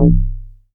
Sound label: DD BAS, Disco House